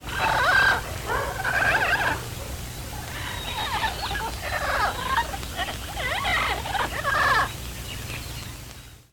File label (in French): cri dans la jungle; King Dinosaur